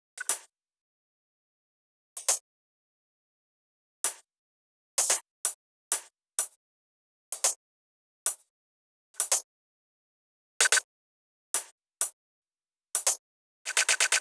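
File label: Drum Loop 128BPM hi-hat; VEC5 Drumloops; VEC5 Multi Loops 128BPM; Loop Elements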